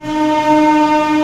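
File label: STR A0 PP, strings; Sound On Sound - Peter Siedleczek's Orchestra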